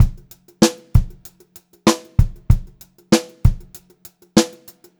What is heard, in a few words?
BEAT, Pop-Rock Drumloops; USB Soundscan vol.37; AKAI; 96 POP